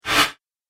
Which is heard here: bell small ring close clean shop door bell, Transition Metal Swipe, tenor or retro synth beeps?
Transition Metal Swipe